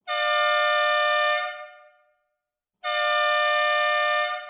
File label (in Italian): 2 cornetti